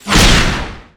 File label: Enemy Woodblock Active Shot; gamerip; disney star smash; android